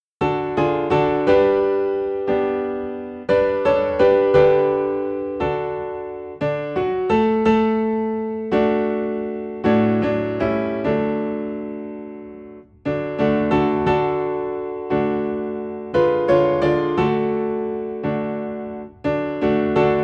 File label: Piano in D